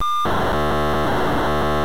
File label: pocsag sample; wirelessness